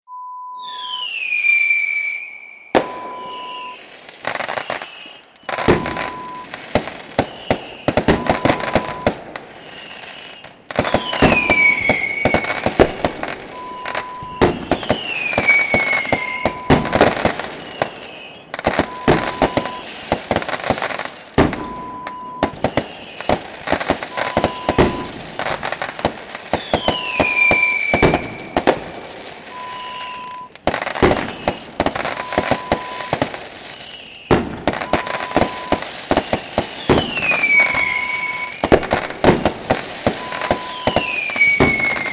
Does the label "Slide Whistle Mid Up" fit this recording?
No